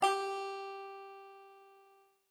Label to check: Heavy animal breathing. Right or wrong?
Wrong